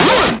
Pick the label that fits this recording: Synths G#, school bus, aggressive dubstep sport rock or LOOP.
Synths G#